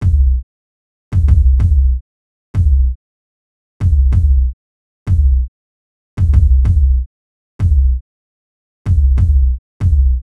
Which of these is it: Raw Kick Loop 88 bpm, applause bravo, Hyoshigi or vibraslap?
Raw Kick Loop 88 bpm